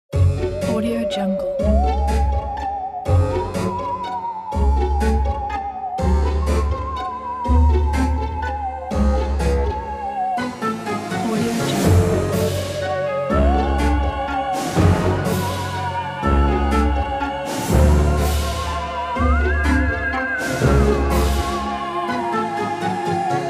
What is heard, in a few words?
it is halloween logo